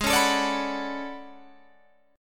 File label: A Major 13th, guitar